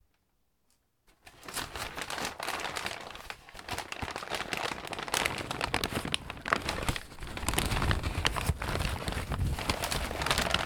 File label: crumpled - rumpled - folded paper asmr; soundscapes